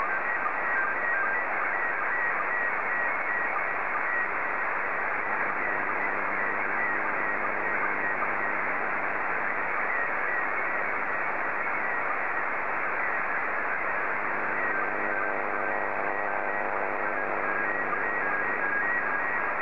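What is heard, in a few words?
PD50, slow-scan television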